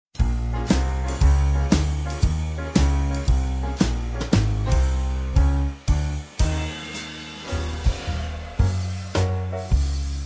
(Gb) No Backing Vocals

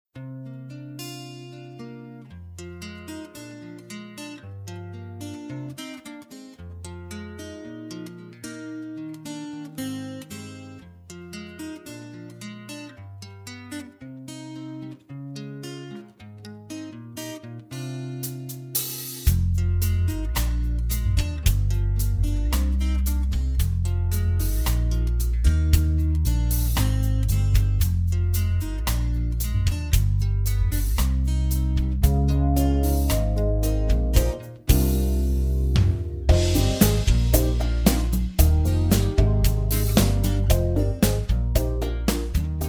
(Eb) No Backing Vocals

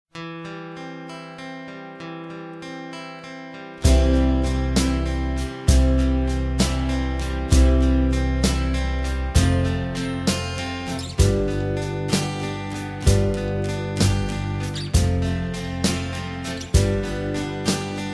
(C) No Backing Vocals

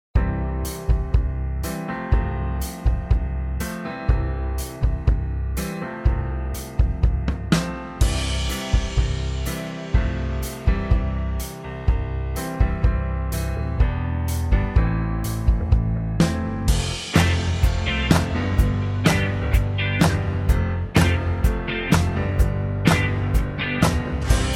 No Backing Vocals, (C)

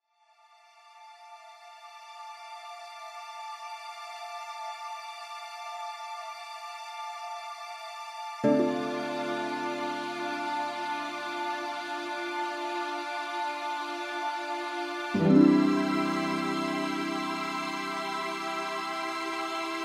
(C) No Guitar

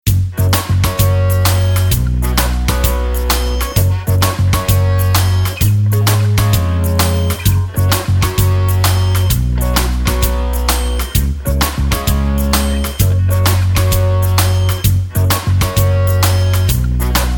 (Gb) No Backing Vocals

